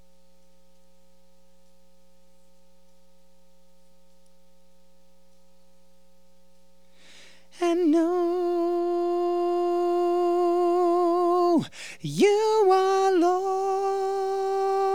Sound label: alto Recording